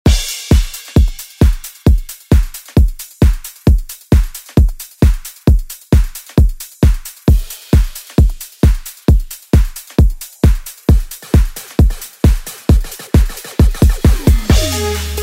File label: Re Drum; DJ Mhark